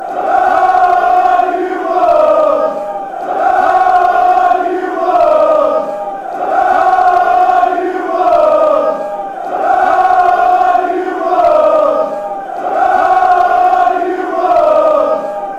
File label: come on you wolves fanchants